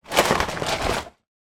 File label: food cereal box movement